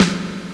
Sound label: Wood Snare REV; Urban Shakedown